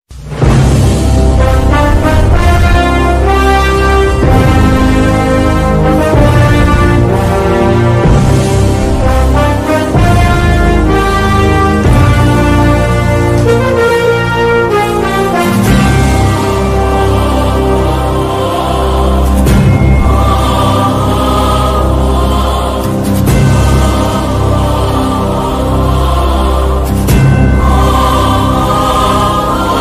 movie bgm ringtone; Khaleja

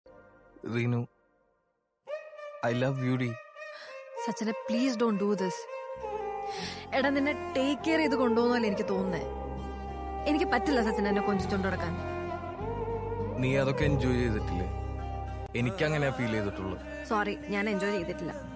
malayalam movie sad emotional bgm

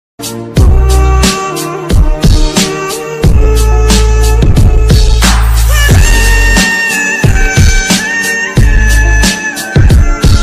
sad joker ringtone bgm ringtone

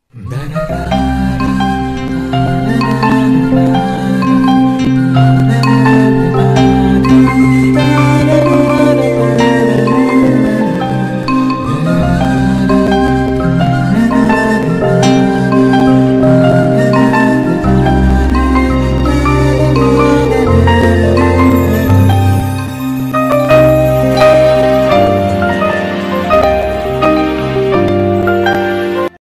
love bgm tamil; mounam pesiyadhe

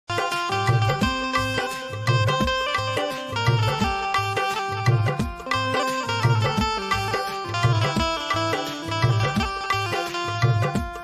hanuman chalisa banjo cover

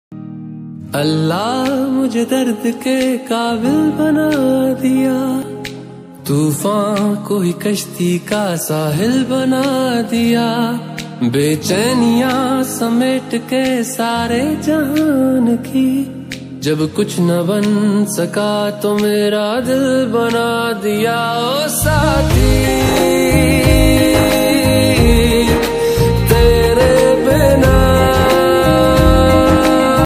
song ringtone bgm ringtone

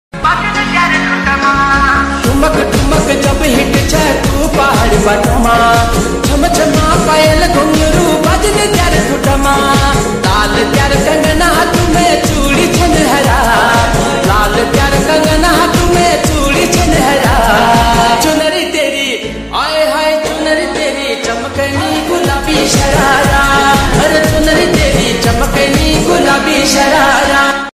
ringtone love ringtone

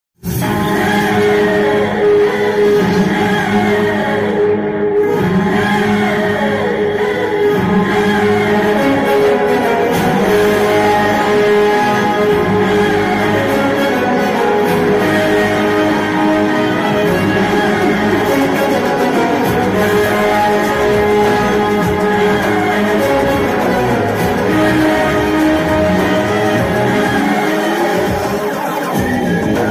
intro bgm ringtone; sarkaru vaari paata; mahesh babu

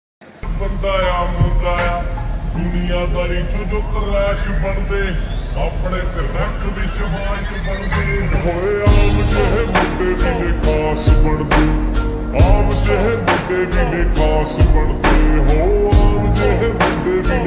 Punjabi song ringtone

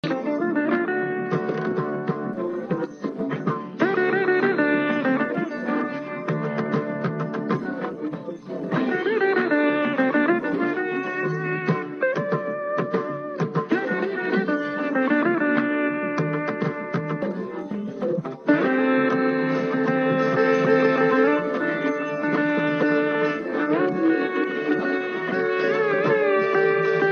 agnyathavaasi bgm ringtone

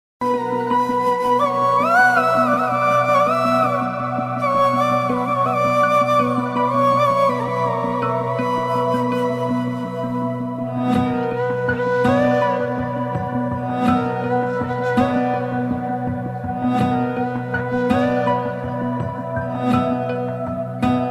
flute ringtone very sad ringtone

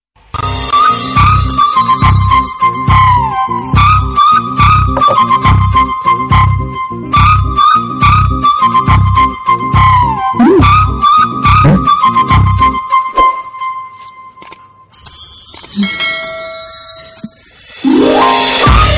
Telugu love ringtone; zombie reddy

